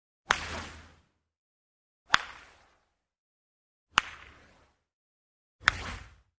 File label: Golf Ball Whiz By